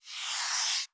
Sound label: Soft Slide Medium